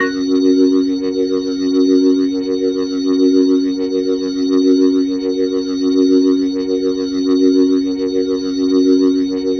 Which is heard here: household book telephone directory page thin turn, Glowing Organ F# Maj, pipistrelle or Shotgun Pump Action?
Glowing Organ F# Maj